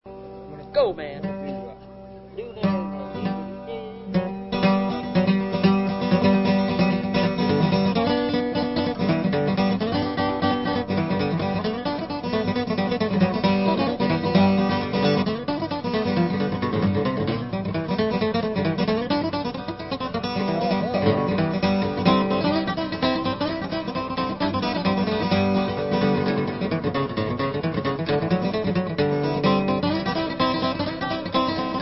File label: steel guitar chimes